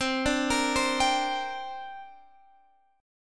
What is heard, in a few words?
C 7th Suspended 2nd, Guitar, Half Step Down